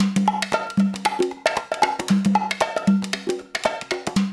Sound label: percussion loop; InVision Interactive; Percussion Slam; PRIMADONNA